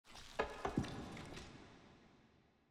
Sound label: ambience room debris; necromastery